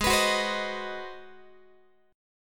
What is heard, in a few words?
Ab 7th Sharp 9th, Guitar, 4A,4E,5A,5D,5G sharp,6C sharp; x,16,14,16,16,14; strummed